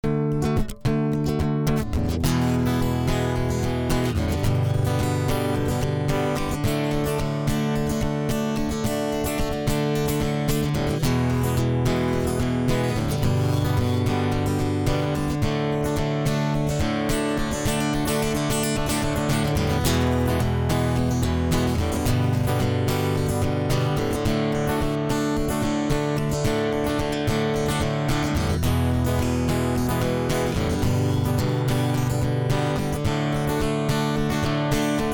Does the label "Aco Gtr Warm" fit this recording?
Yes